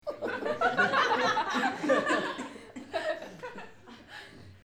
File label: Crowd-Small Group Laugh; Small Crowd, Mixed Group Of Men And Women Laugh; Laughter; Voice